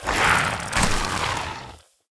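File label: ice scorpion dead, monster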